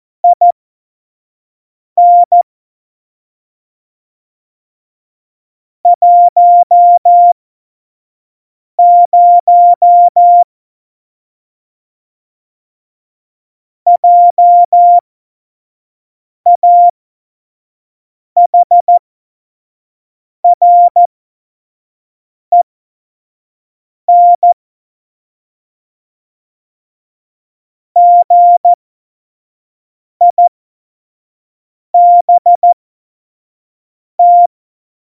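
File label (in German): Witz, Tempo 70, Morsekurs, cw morsen; Witze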